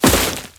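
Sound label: Crewman Step Vegetation, Footsteps; Warframe; Corpus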